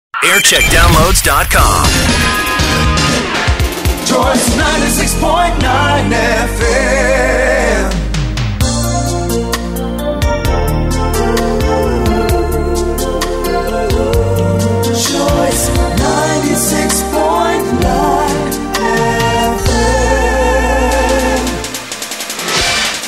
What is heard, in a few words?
jingles; choice